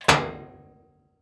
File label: Household Lid Washing Machine Lid Close